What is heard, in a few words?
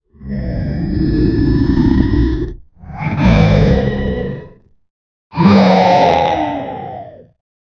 monster sound attacking